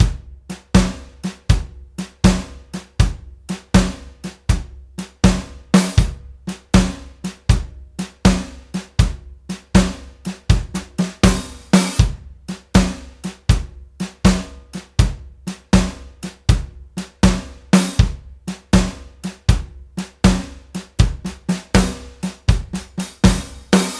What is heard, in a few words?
drum loop blues shuffle 80; guitar looper